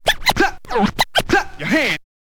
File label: scratch kit, vinyl